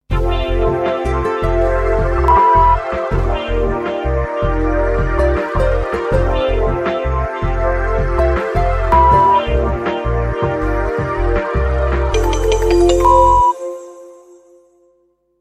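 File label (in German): Sound Logo mit Sound Bett 5 Töne Dream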